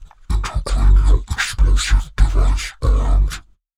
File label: Ied Armed; Cruelty Squad